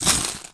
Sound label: coins bag